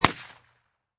Pistol Remington Gp 38 Single Shot Exterior At 45'